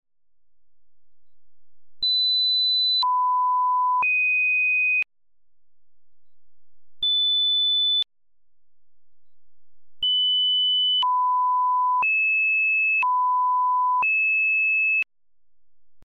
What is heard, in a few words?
simulated optophone